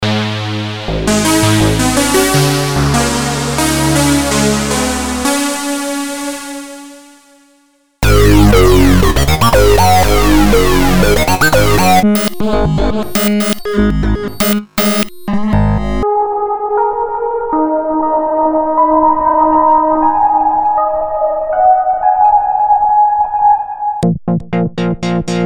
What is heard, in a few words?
Synth Leads